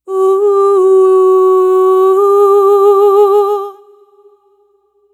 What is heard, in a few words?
Celtic Voice; Sonic Foundry (Sony Creative Software); Continental Drift Aftershocks; Vocals